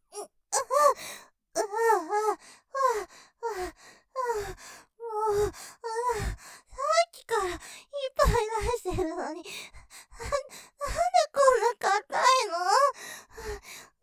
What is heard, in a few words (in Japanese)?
喘ぎ; さっきからいっぱい出してるのになんで硬いの！?; ループで楽しむ！シェフの気まぐれ抜き抜きコース